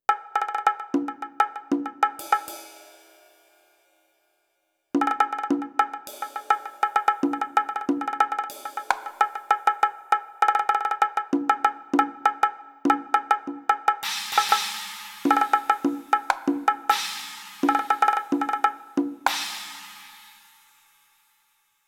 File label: perc 100